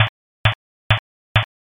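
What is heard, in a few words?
footsteps asphalt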